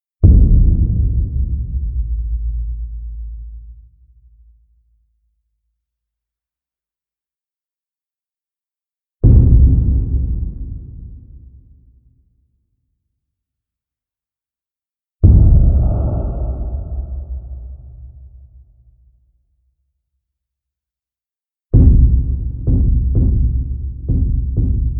sub booms

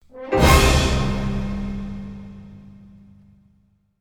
orchestral hit

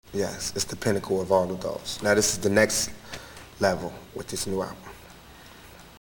intro instrumental

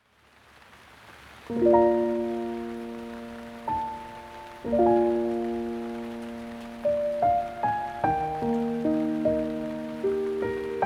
dinner music with rain, piano

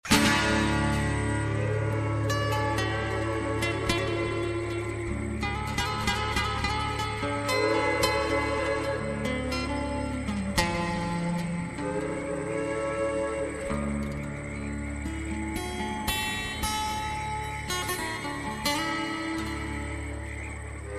acoustic

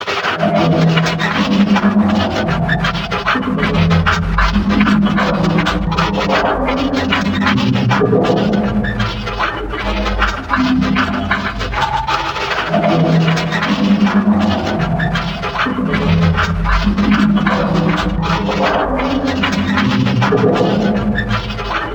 scratches and dust

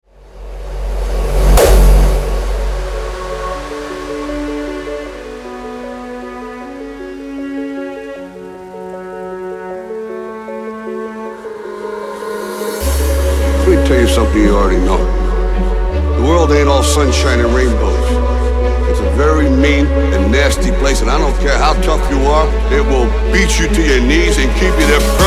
hardstyle

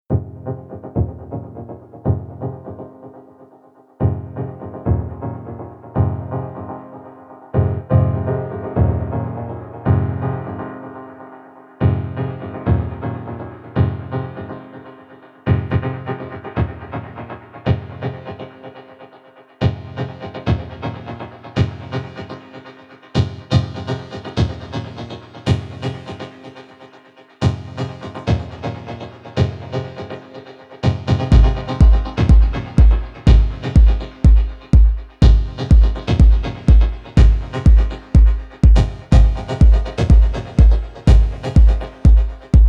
chords

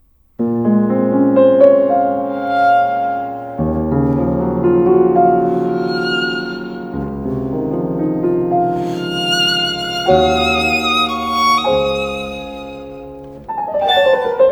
bagatelles for piano trio moderato; 1985

